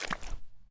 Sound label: mud, Footsteps; zps; Foley